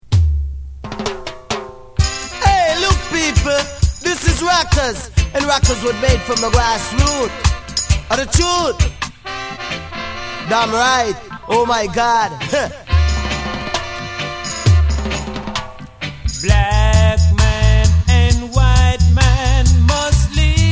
Channel One

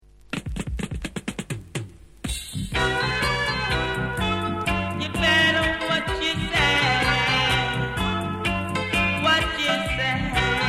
Sweet Reggae Music; Burning Sounds

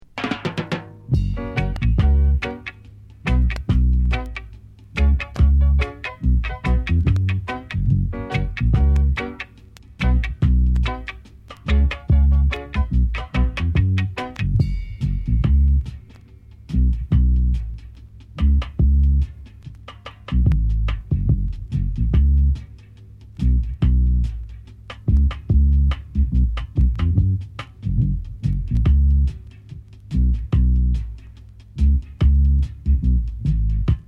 Jam Rock Reggae; Impact

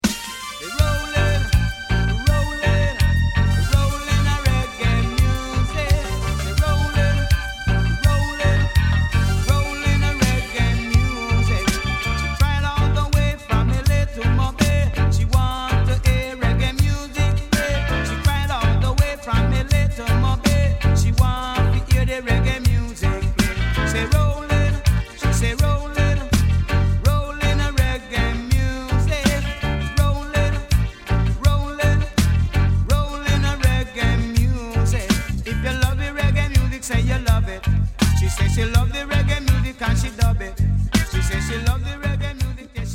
Rolling Reggae; Greensleeves

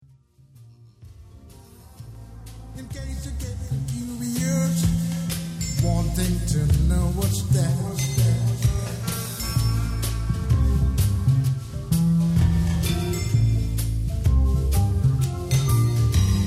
Jazzy Reggae Dub; Bristol Archive

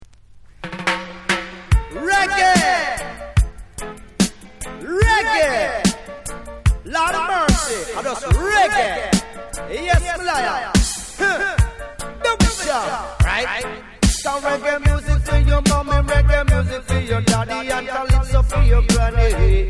Reggae Music; Channel One

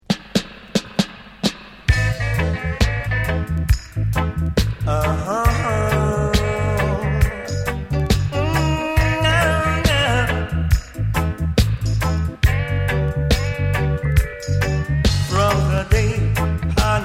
Reggae Singer; Starlight Records

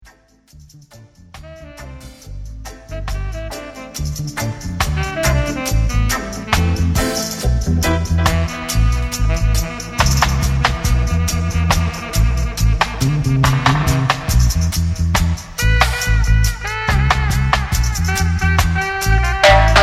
Hornsman Chant

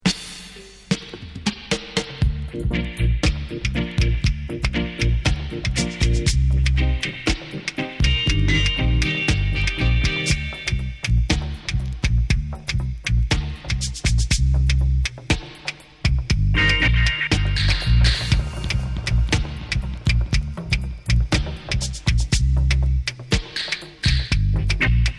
Tamborine Dub; Twinkle Music

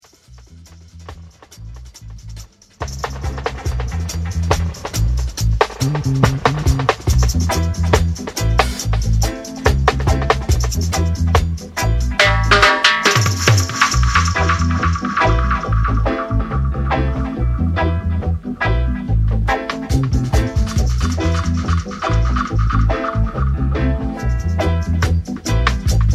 Chanting Dub

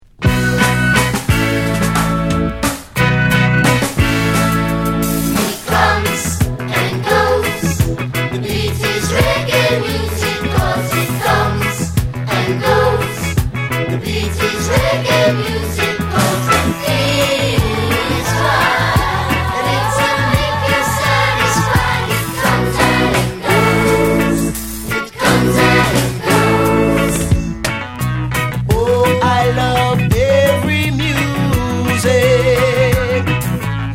Reggae Beat; A And M

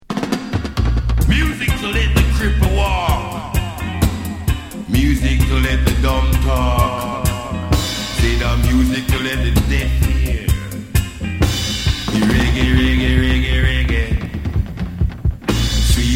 Reggae Music; Trojan

